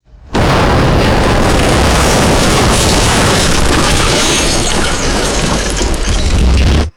heavy building collapse with scattering debris; destruction

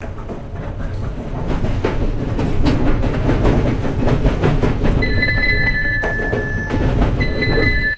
single electronic chime in train carriage; alarms

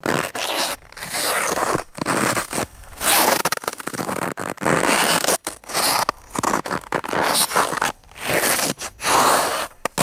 squeaky foot grinding in cold snow; footsteps

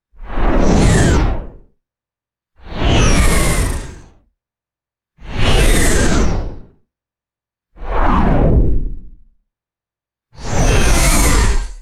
long airy synthesized noise whooshes

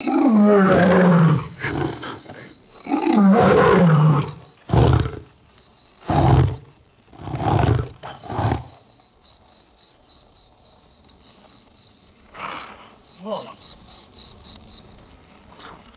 lions snarling with background insects; animals

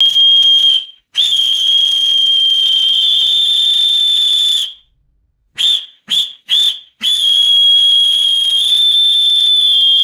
short and long pealess whistle blasts

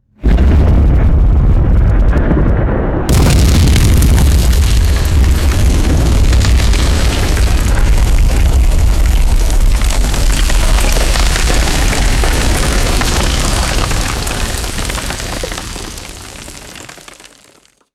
heavy explosion with falling debris; explosions

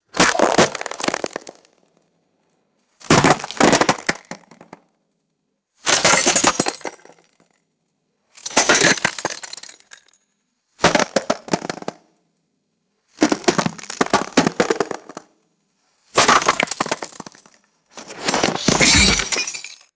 heavy ice chunks dropping and shattering; water

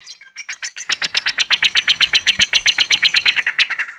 harsh rattling bird call

